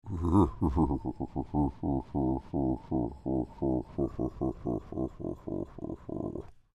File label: Creature Devilish Laugh Lite, Human; Evil Laughs; Horror